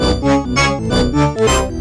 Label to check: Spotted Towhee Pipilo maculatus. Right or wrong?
Wrong